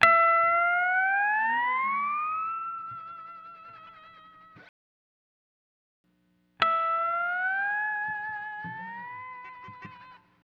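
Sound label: Steel Guitar, E Dry; Zero-G Kocktail Kollection; Construction Kitz (Lounge); Volcano at Sunrise 074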